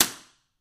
Belt Hit Slap Flat

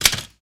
Typewriter Manual; typewriters

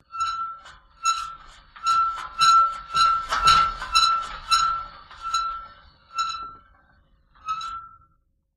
Squeaky Wheel

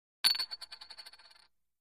Poker Chip Drop, casino games chip mvmt

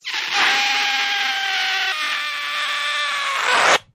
Balloon Deflating Ra; balloons

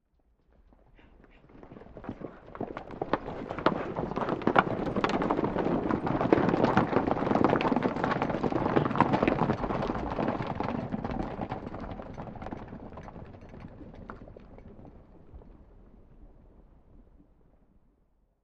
Horses 4 Horses Gallo

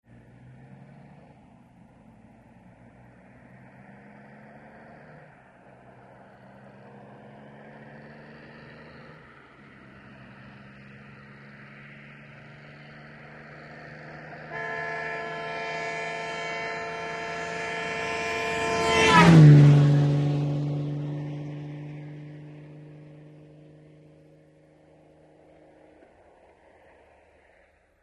Aston Martin Dople By, ext.; horns; VEHICLES

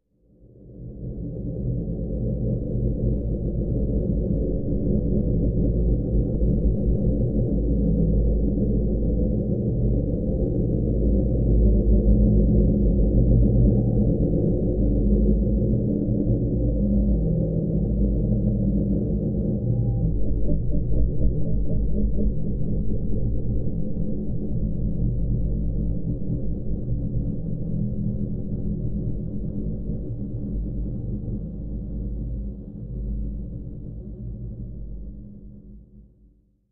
spooky noise, drones tones

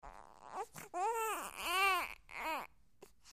Baby Sniffling Whine

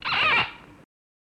Cork Squeak No Pop

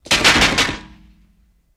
Bullet Impacts Vari, metal

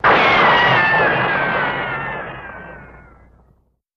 Ricochet, bullet bys & riccos

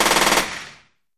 Machine Gun Sngl Rap, single burst

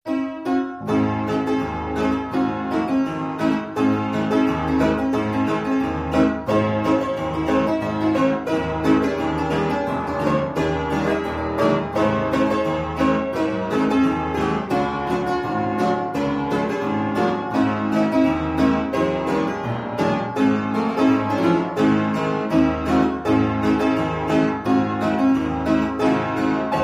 Piano Plays

